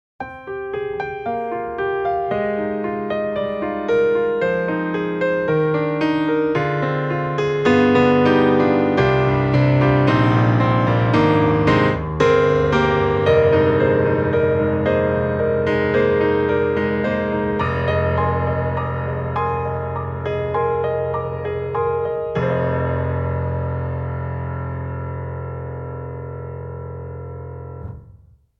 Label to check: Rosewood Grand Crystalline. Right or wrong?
Right